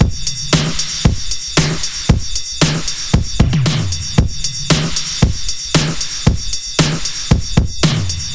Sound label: 115 Beat Meany; Beats; Zero-G; Future Wave